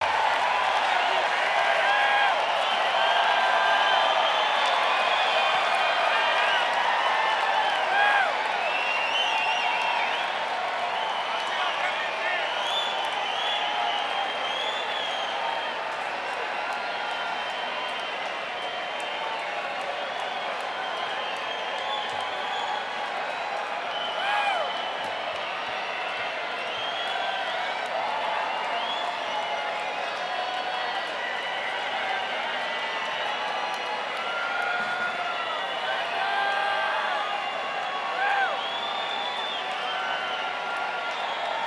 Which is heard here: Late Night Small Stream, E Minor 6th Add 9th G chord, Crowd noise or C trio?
Crowd noise